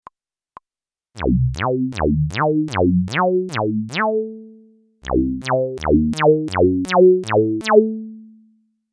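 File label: Nord Stage EX vs Nord Stage 4 Reso Bass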